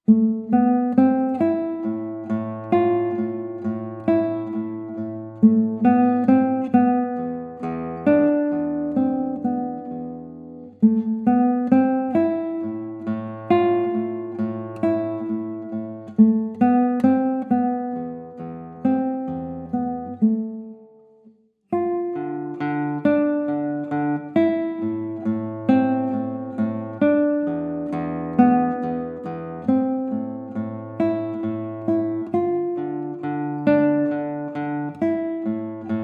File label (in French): valse; Simple valse